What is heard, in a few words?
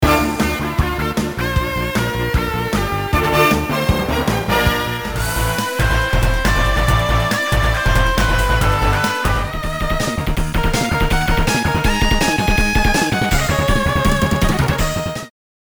guitar